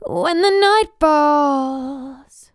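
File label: When The Night Falls D Minor 86 BPM, Vocals Phrases; GhostHack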